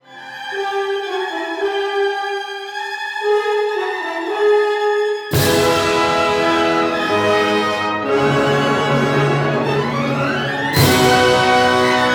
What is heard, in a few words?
Philharmonic Orchestra